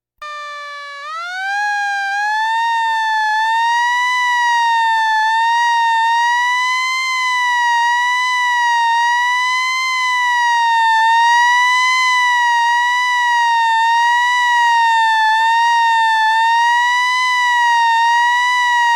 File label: Police, Fire engines